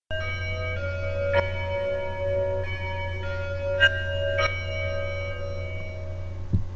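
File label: Doorbell Westminster Chime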